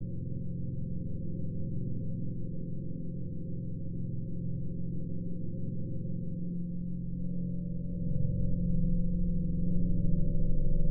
electric gamelan ENGINE NOISE

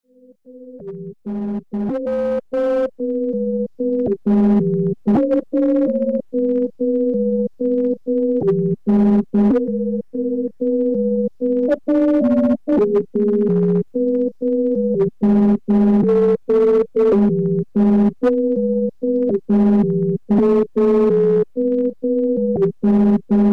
electronic beep noise

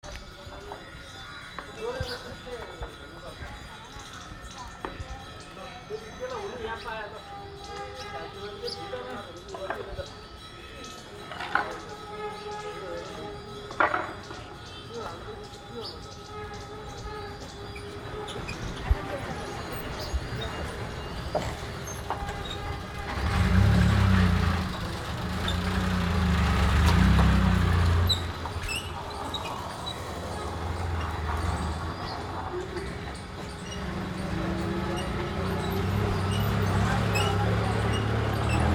ritual industrial screech, Kathmandu; Kathmandu Ambient sounds

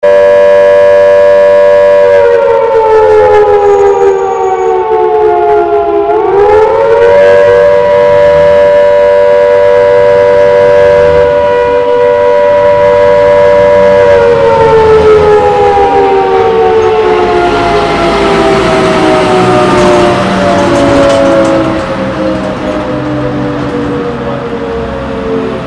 siren port chalmers

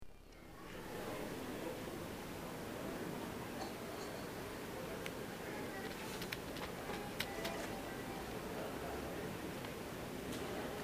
atmosh airport beep, street recordings

